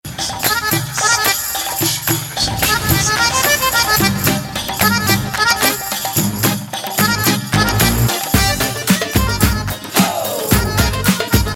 Casio

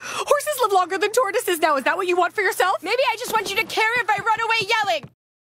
run away yelling

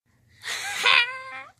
Haaaah

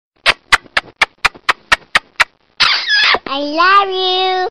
Kisses Msg Tone

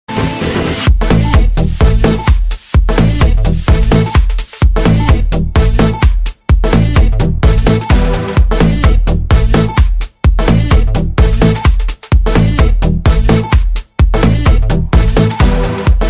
Club Sound

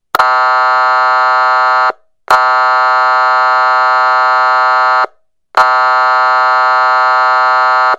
Air horn msg tone

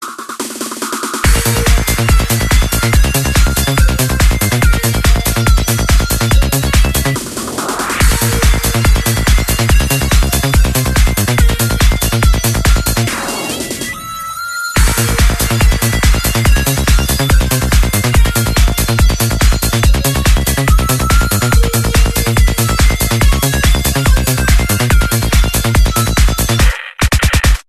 Trance

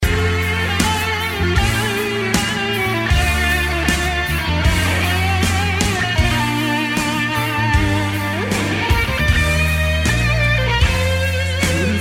Rock N Roll

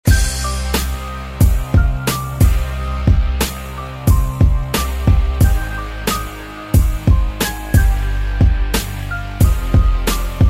Beat

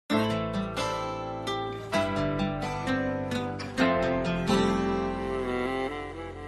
Soft Sms

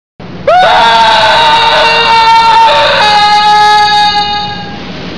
Falling

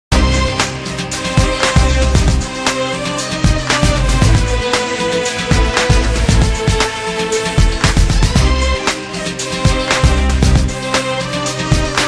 Success Music

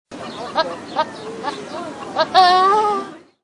Chicken Goat

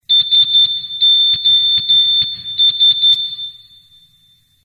Morse Sos

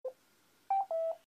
Police Radio Bleep

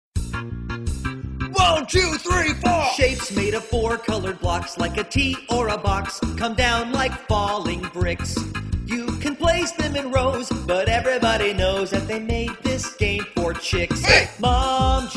Tetris Song

